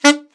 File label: ALTSAX D3; Best Service; Alpine Volksmusik